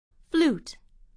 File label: flute